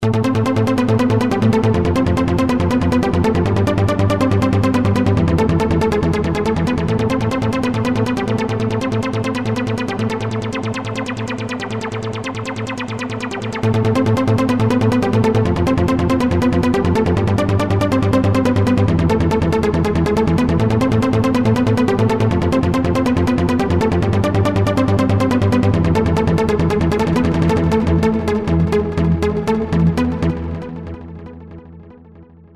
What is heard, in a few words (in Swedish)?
softigt trans riff